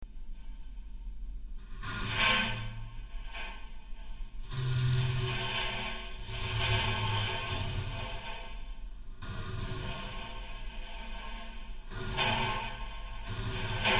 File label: cast asphalt